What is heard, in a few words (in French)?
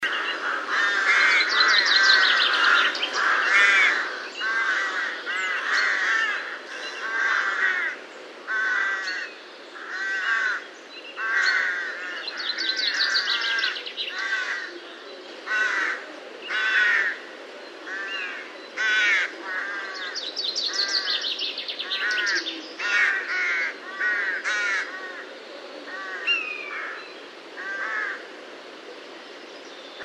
chant corbeau